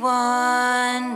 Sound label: vocal